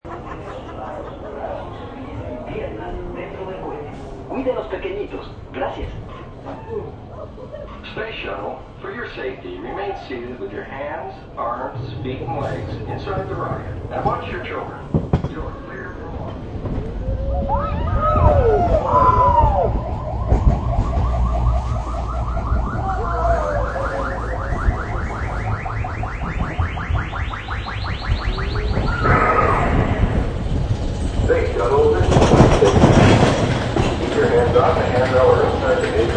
Rollr Cstr, Recording taken on roller coaster space alien ride.; Rides; Games